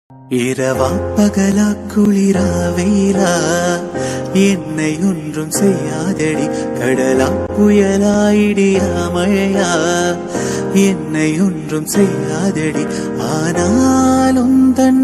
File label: song tamil music tamil songs tamil bgm